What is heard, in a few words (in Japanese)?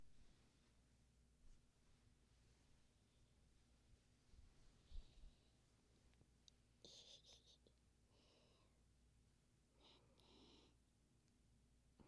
心音と寝息